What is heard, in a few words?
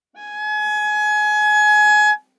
Viola arco ff sul D Ab5 stereo; MIS Pitches - 2014; Strings